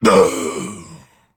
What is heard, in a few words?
Male Dracthyr Death